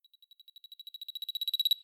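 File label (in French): Accélération De Particules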